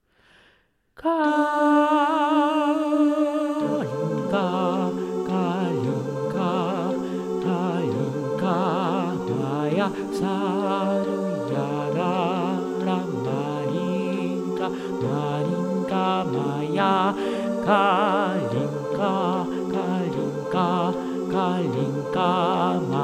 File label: basses; kalinka